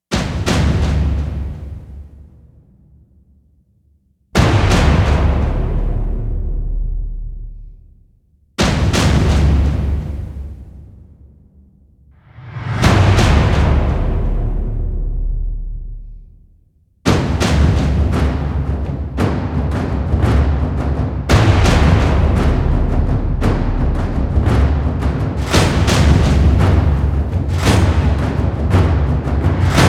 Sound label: no synths